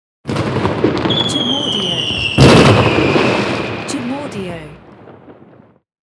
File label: Short Firework